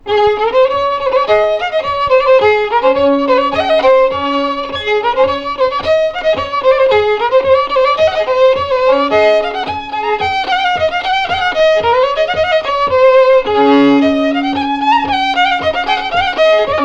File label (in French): Marche